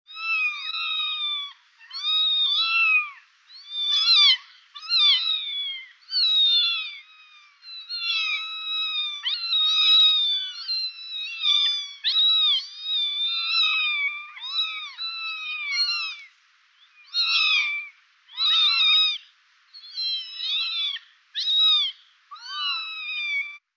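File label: sound of kittens meowing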